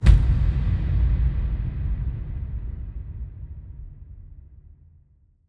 Percussion Hit; One-Shots; Ghosthack; SkyRealm; Percussion Hits

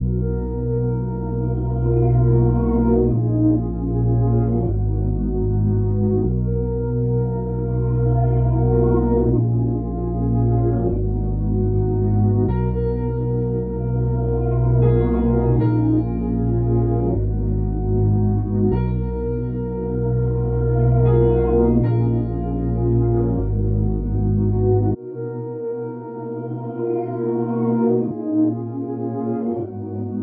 154 BPM B Min, RnB Melody Loops; RnB Collection; Into Pieces

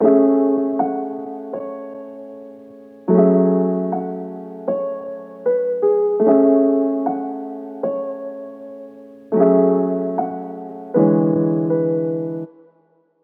Piano Wet - 77 BPM F# Min; Melodics; Cymatics - Eternity Sample Pack

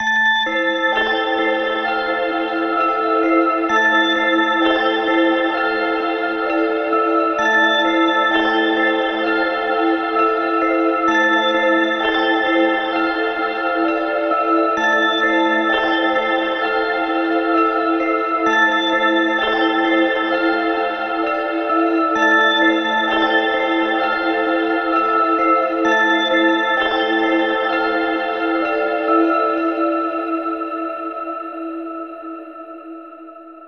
Bells Intro Am 65Bpm; Construction Kits; Ghosthack; SkyRealm; Solace in Falkreath

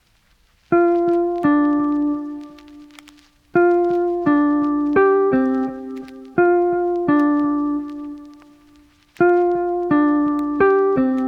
Lofi Melody Loop - 85 BPM A# Maj Guitar; Melodics; Loop Stems; Cymatics; Eternity

